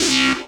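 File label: Rave Synth, Dance Mega Jungle Rave; Best Service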